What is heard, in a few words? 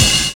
CRASHING; Univers Sons